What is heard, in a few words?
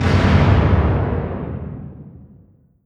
distance heavy armour fire; Hearts of Iron IV Death or Dishonor